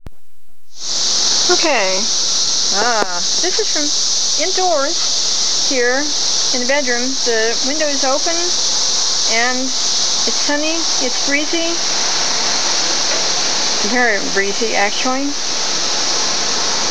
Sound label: cicadas; Taped around 4:30pm, outdoors, on Monday, May 24, 2004; One tape recording, three files